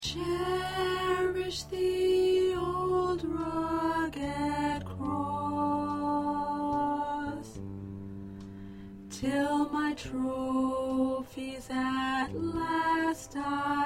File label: Chorus Soprano